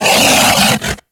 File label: Feral Vocal Melee Attack; Warframe; Kubrow